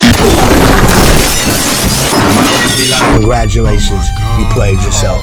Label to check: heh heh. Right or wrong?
Wrong